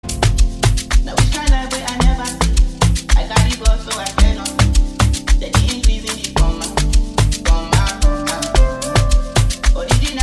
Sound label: Amapiano Version